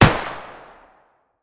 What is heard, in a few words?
rp46 shoot, weapon; metrocraft